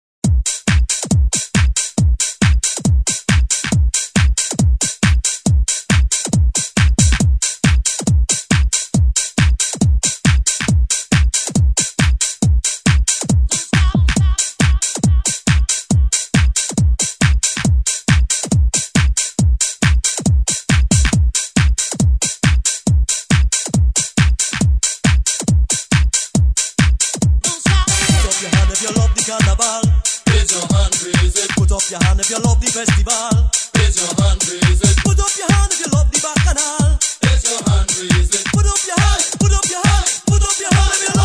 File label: house